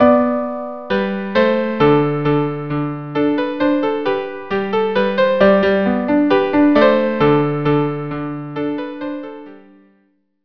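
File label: violin viola duo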